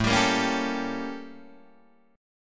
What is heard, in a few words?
A 7th Flat 9th Guitar Open D